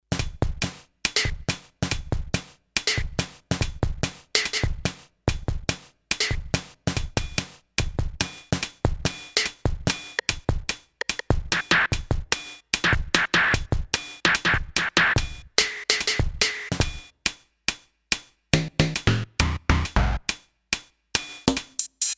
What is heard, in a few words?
FM Attack